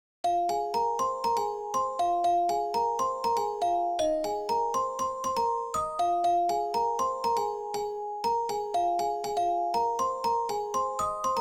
bells pizz, 120BPM

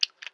Sex Slide

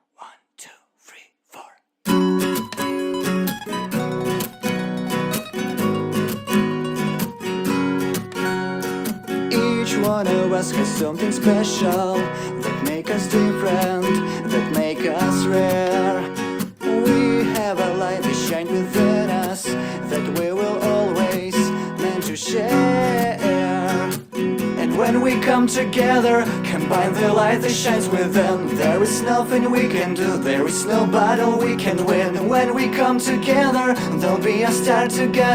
acoustic cover

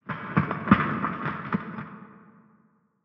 building rubble; War Ambience

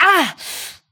Grunt; Lewd SFX

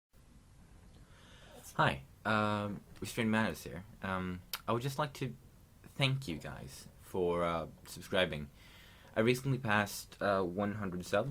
(Autumn) (Acoustic Cover); Pony Music Archive

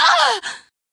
Short Scream; Lewd SFX

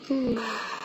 Moan

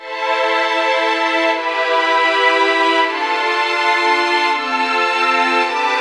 Violins, 160 BPM

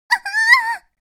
Short Scream; Lewd SFX

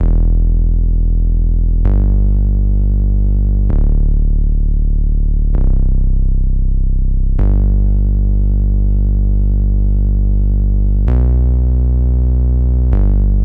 Bass

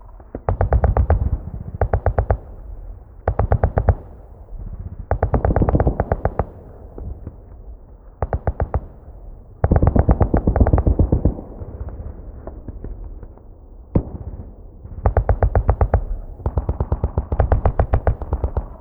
battle loop, War Ambience

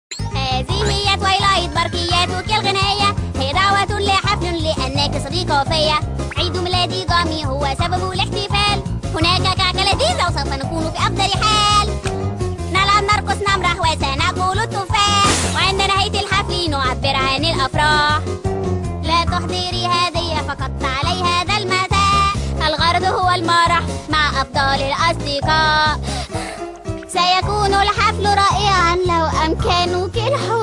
Singing Telegram (Arabic); Season 1

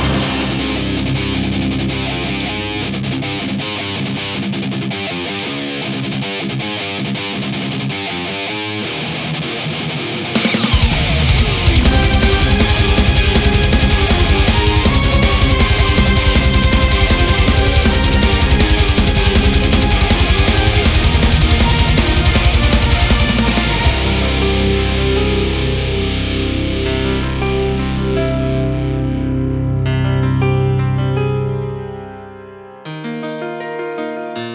instrumental+choir

